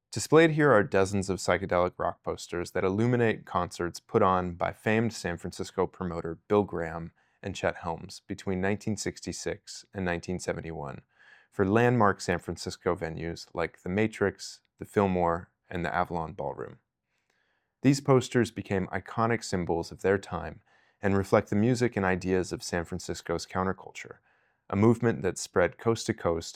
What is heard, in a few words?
Psychedelic Rock